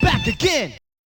Hip Hop Vocal

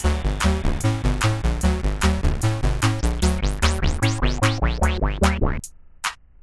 PacMan Beat

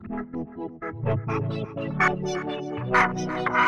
Pad Floaty

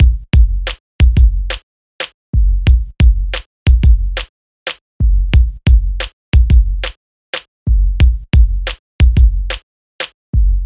Hip Hop Clean

